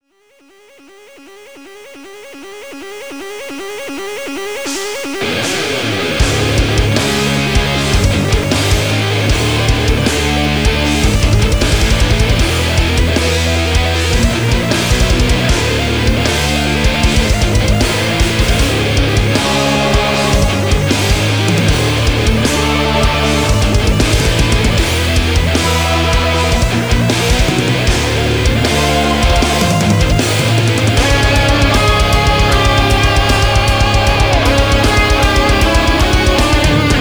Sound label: metal; final fantasy 5; metal gear; lufia 2; super street fighter 2; mega man 2; castle